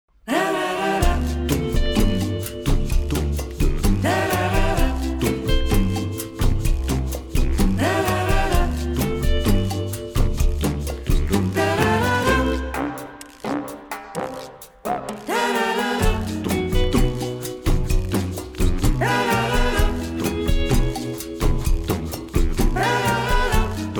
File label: cha cha